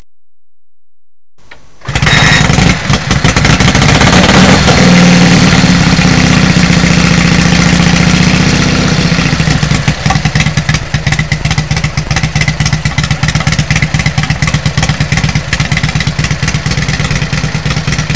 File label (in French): Indian, moteur deux cylindres en V; henri indian